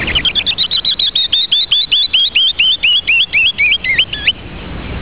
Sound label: wren